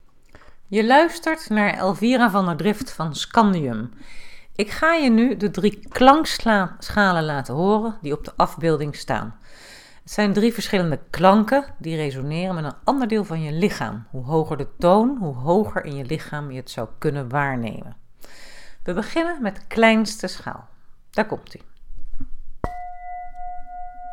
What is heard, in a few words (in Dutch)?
Klankschalen kennismaking